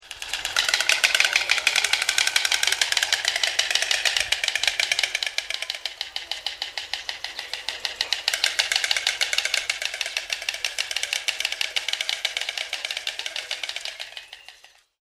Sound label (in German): Storchklappern